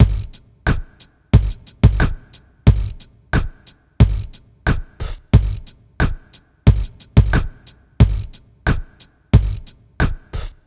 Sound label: drum; csound